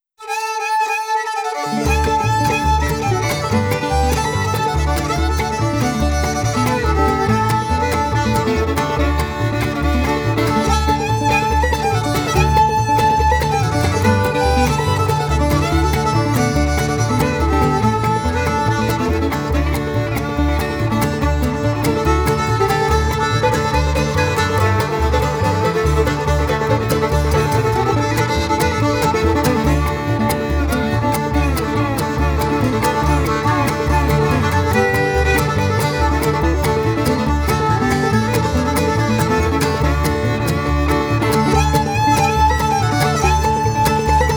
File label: Polecat Blues